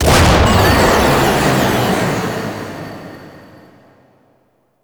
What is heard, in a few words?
Ship Secondary Core Explode; Fomorian Ship; Space Battles